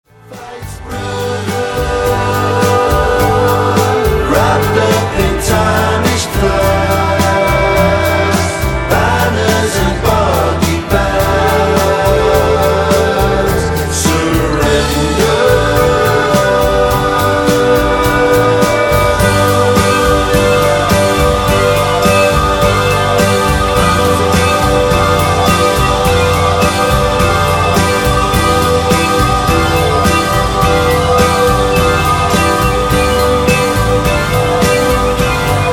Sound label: piccolo